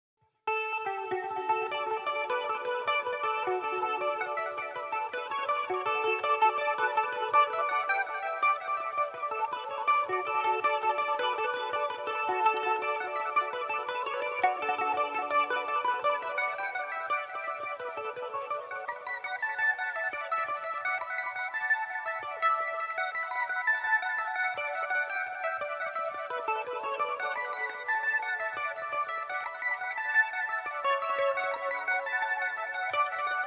Revelation Jaguar electric mandolin using a GarageBand amp setting called "modern British metal"; jigs; The Connaughtman's Rambles/Tiocfaidh Tú Abhaile Liom?